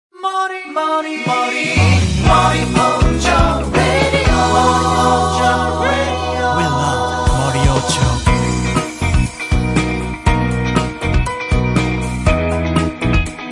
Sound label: radio; morioh cho